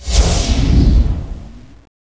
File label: Fire Cast